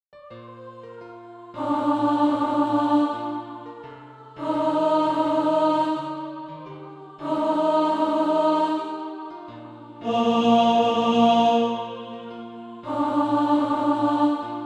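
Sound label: alto; Calvary Darwood Sheet Music (SATB)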